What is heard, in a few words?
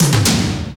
out Of Credits; mega joker